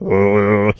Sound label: pig singing